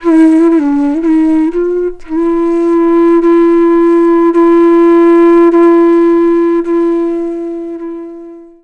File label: FLUTE D, Indian Traditions